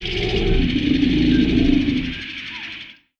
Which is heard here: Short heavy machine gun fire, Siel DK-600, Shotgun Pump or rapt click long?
rapt click long